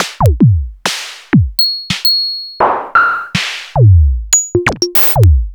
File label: Roland System-1001